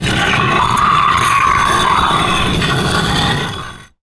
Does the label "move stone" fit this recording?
Yes